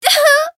damaged

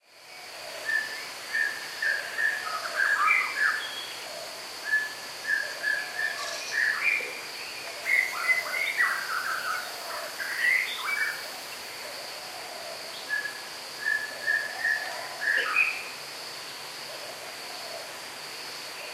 bgm environment; hokishi

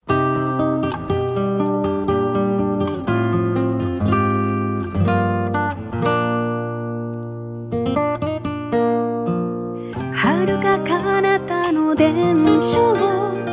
Bgm minstrel song; event serial gaiden

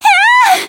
damaged; yukino

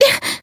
Voice damaged; hoozuki

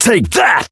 Voice attack; hardy

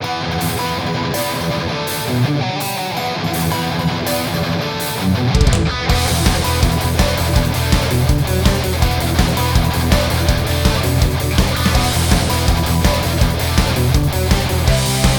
Bgm event bike race minigame

